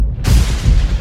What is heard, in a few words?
Open